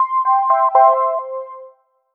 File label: Batch Complete; rawTherapee; rtdata sounds